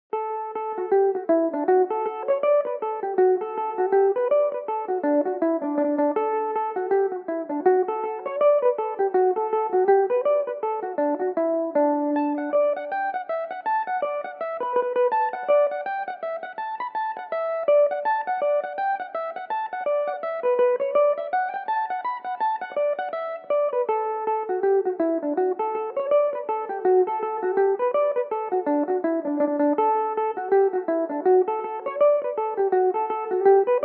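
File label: reel D major belmuse, irish mandolin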